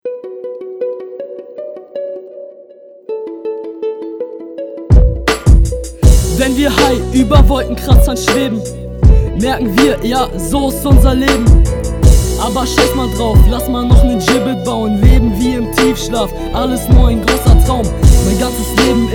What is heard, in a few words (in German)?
zupfer beat